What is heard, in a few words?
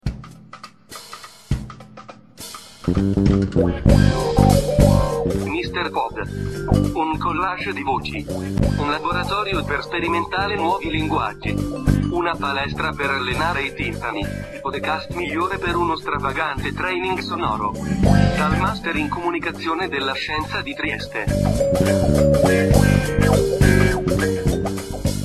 jingle intro